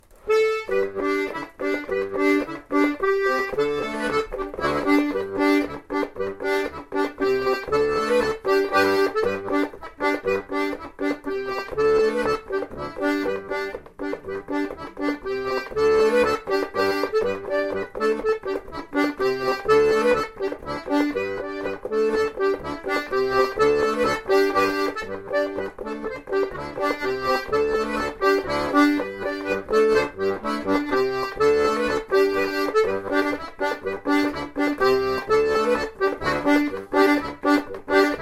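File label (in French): polka irlandaise, accordéon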